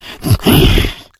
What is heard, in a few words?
become alert, infected